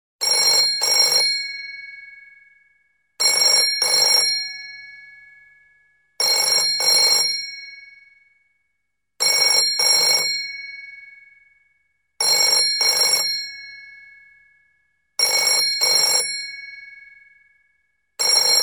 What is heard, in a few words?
old telephone ring ringtone; android